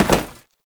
Step Metal Perforated; Warframe; Oraxia; Foley

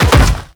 Land Metal, Footsteps; Warframe; The New War; Characters; Kahl

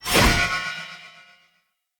Player Short Sword Throw Perfect; Weapons; Swords

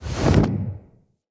Exit Aim Glide, Foley; Tenno

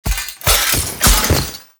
parry finisher foley; Enemies; Warframe; Duviri; Dax

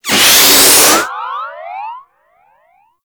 Balloon Inflate; Festivities; Warframe; Follie

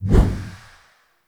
Void Projection Exit; Warframe; Focus; Operators; Operator Wraith Movement

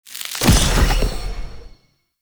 Ground Spike Destroy; Enemies; Abilities; Warframe; Zariman; Void Angel

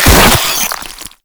Proto Infested Maggot Pain; Warframe

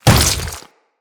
Step Flesh, Footsteps; Enemies; Grineer

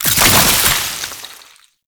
Alchemy Grenade Pickup Cold; Entrati

